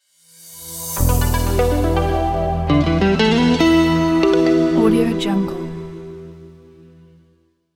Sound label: logo